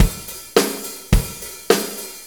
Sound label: 4-4 Loop, Drum Loops, Full Kit Loops; Drum Tools; Sonic Foundry (Sony Creative Software); Loops & Samples Drum Tools